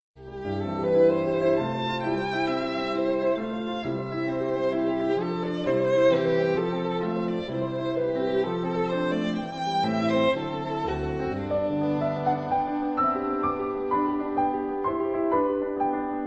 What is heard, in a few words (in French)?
Sonate violon piano